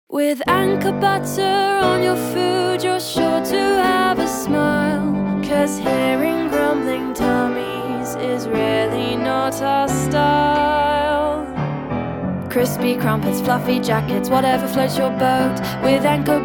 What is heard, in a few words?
Intro Original Ending